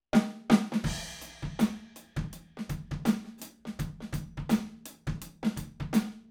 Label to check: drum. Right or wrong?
Right